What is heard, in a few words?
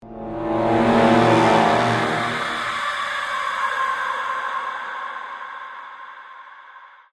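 Music Street Combat Intro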